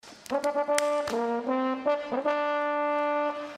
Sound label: fanfare on trombone; xavier woods